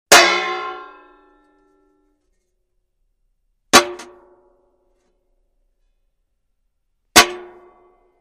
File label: Hitting the frying pan; tableware